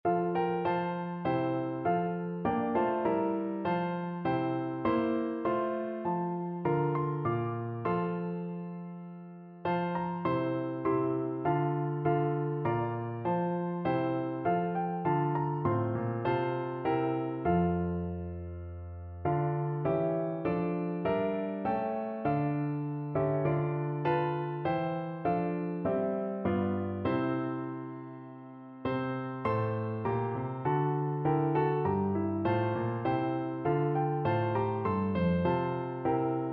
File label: midnight clear, piano duet